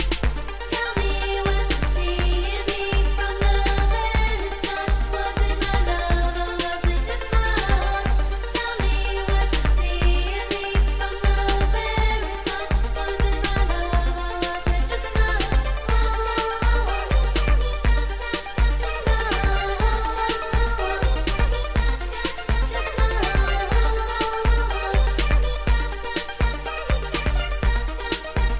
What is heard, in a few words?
latin freestyle ringtone